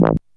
woah, Synths; Notes

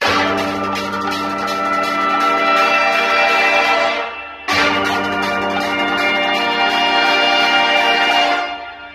horns, Phonky loops

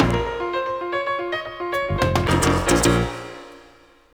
piano, sad

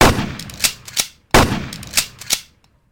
gun shots; Crash Bang Boom

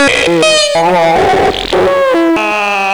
random noises; Voice

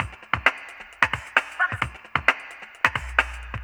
spoonie, Drum loops

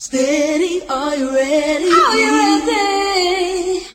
steady are you ready are you ready SUNG

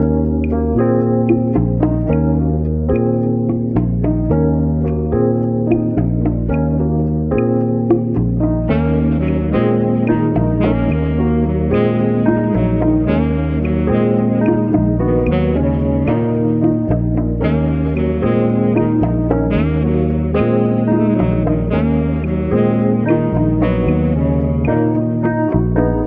vibes; Loops; Phonky

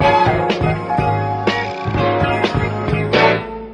horns, phonky loops

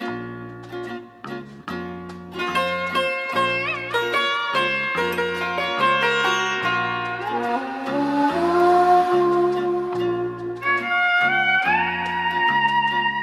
guitar, Jazzmatazz loops

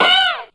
ow, Voice ooh ahh ow ouch

